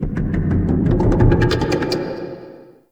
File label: Distant Clicking; Warframe; Duviri Murmur; Ambience